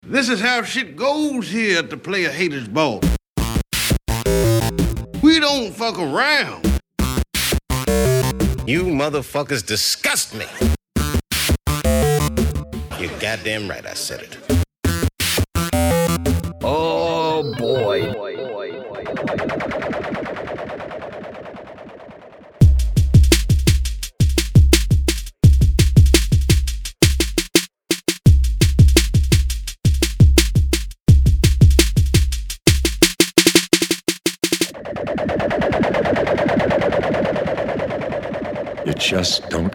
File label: Scratch Tool